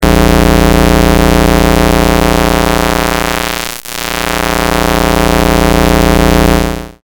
Noisy

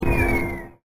whistle

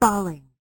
FALLING

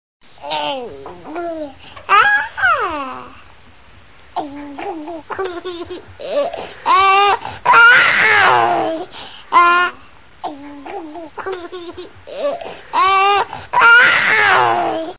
Baby Laughing

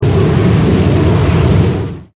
JET

